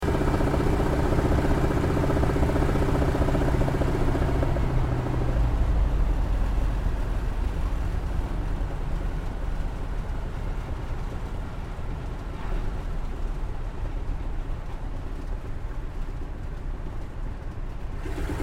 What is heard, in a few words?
aircraft 1945 cac wirraway touch n go interior stereo